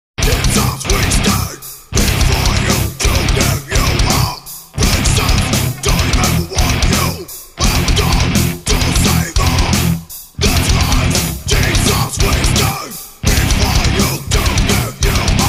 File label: Horn Of Salvation